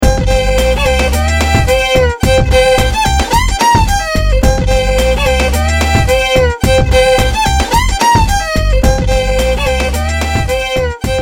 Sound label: country